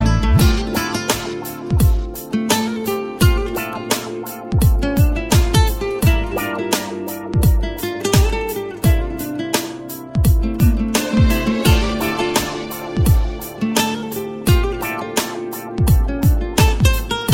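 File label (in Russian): хорошая спокойная музыка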